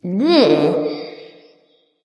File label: tongue light; smite; voicepack; hel